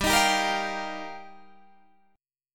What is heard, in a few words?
G Minor 7th Sharp 5th G, Guitar Half Step Down